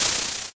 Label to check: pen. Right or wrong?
Right